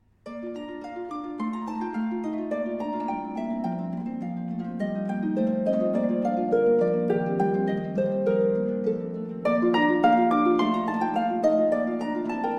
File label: Sonata Op.2 No.1 B Flat Major Rondo Allegro; S Dussek